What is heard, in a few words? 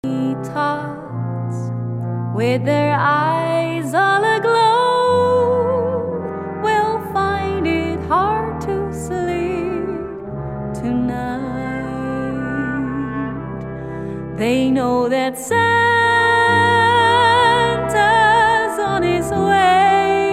Short Christmas Song, brass